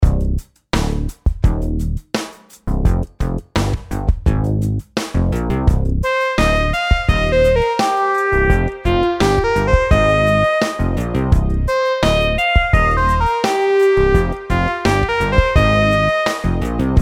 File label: rhythm simple 8s triplets